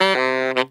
saxy; Stabs

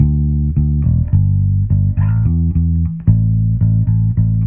bass; Phonky

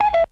cuckoo

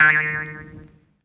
boing

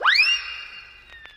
whistle up

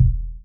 kicks soft

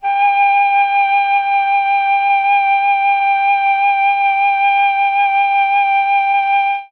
flute; Winds

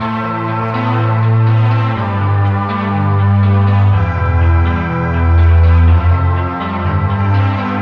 guitar solo

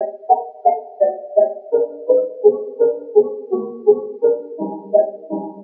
pistol music remix, loops electronic

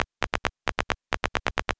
egyptian ticks; Drum loops

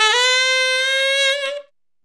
sax; Winds

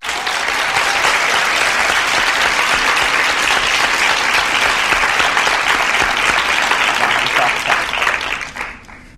applause

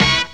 bronx stab

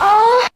"oh" orgasm; ooh ahh

